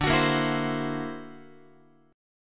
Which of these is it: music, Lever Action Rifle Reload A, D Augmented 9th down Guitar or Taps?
D Augmented 9th down Guitar